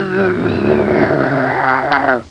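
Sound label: thunder scary; My Science Fair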